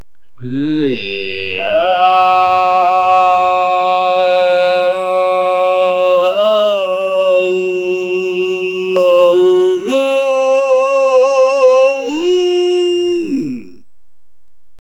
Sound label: voice vocal yee; human vocalization